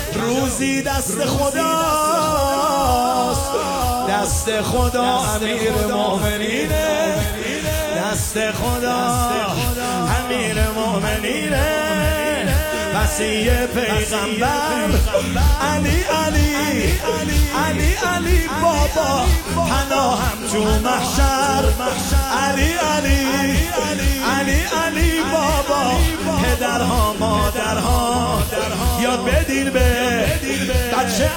مداحی

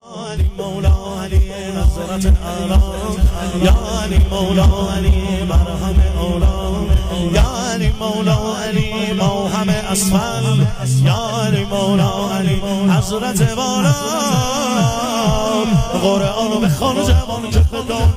مداحی

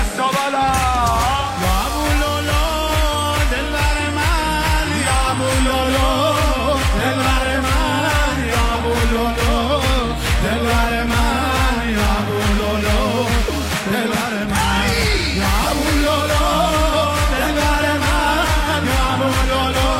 مولودی

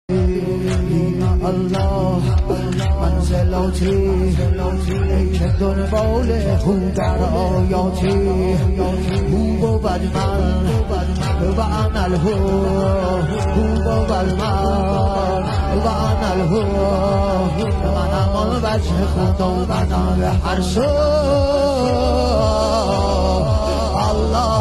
مداحی شور

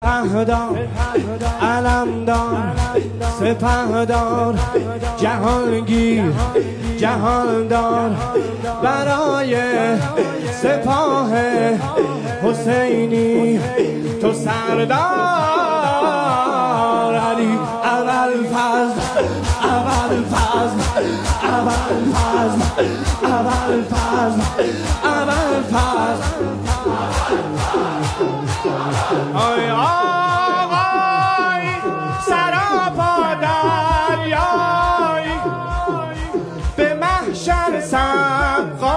مداحی; شور احساسی

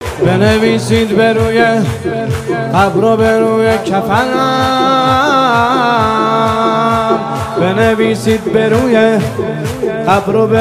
مداحی; شور

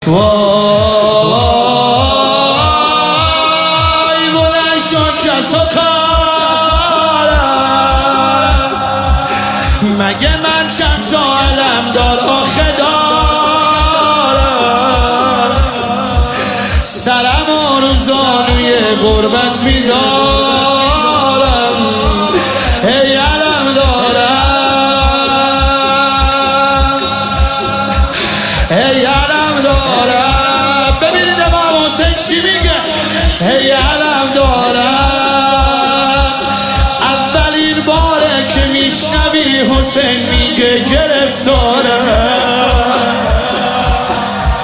مداحی

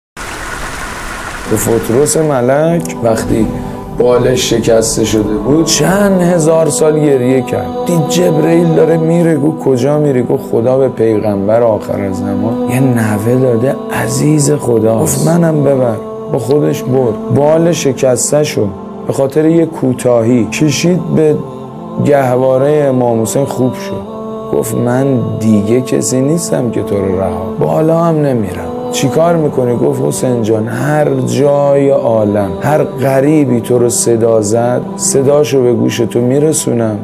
مداحی

